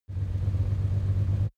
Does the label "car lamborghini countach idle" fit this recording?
Yes